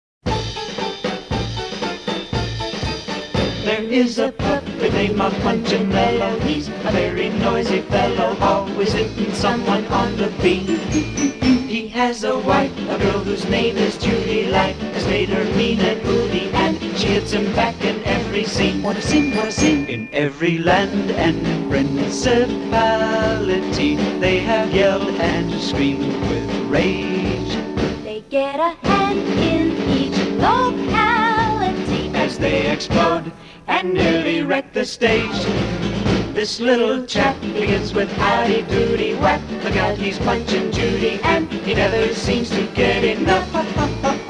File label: Male, Female Voc